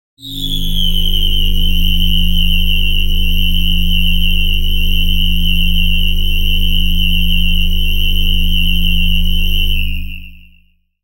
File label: sound design electric droning